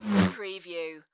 Bullet Fly By; sound-effects-bible

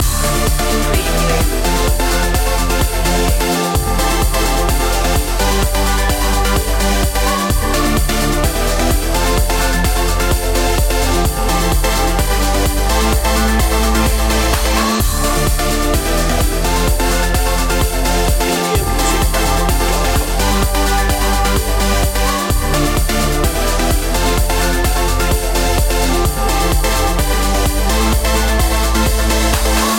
trance elevation loop

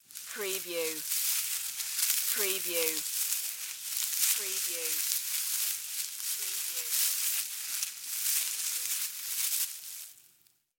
Critter Movement Grass

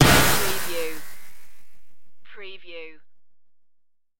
dirty futuristic impact

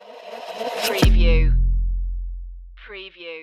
clap hop stinger

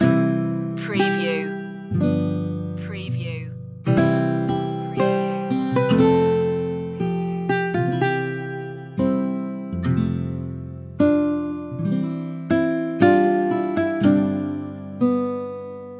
christmas spirit loop; Acoustic Guitar

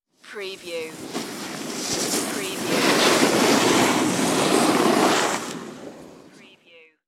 Snowboard Stops

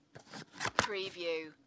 Paper box or cardboard box open

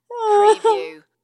Female Short Sob

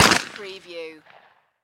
Shotgun Beretta; sound effects bible

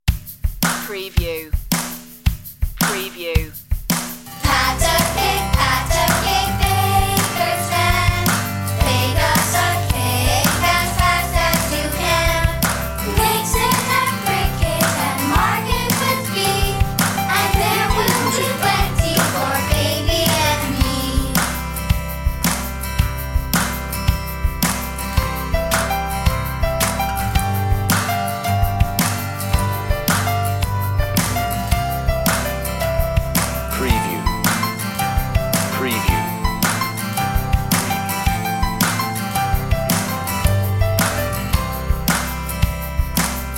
pat a cake children group vocal

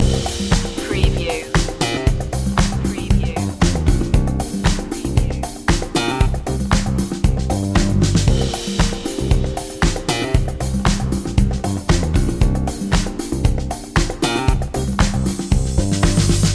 soul fusion loop

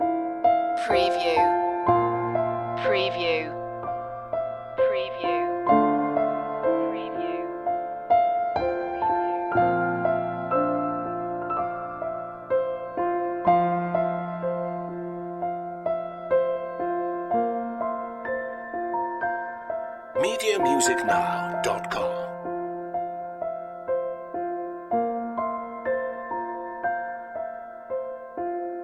soft surrender loop

